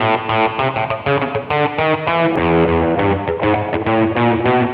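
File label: distortion guitar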